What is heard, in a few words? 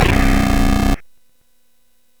Fast Sfx, CSG8580R5 sid